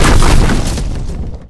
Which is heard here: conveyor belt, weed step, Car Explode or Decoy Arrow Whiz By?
Car Explode